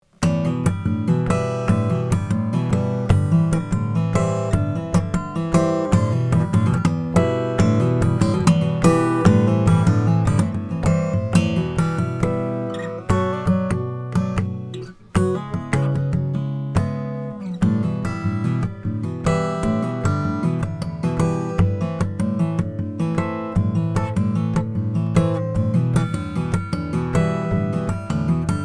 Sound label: greenfield gf wenge